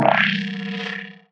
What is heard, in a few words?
Sci-fi Teleport Blast